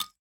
page Button Clicked; wilderland

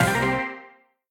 sticky Win; jackhammer 2

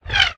cannon Ball; rage of the seas

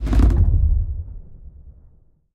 nudge step; rise of maya

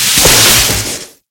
respin transform

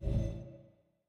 spin Button Over Anim; goldengrimoire

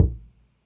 selector Click; alohaxmas

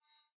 button Hover; halloweenjack

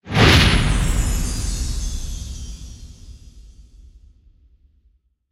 big Win Text Change; hiddencoinsofegypt

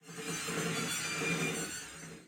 heartbeat; superstriker

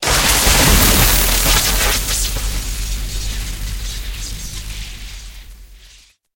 mega Win Text Change; wildotron 3000

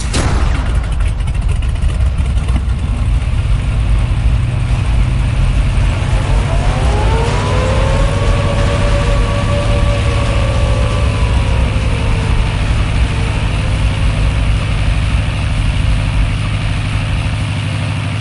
bomber Shake Effect

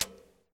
button click; spinsane

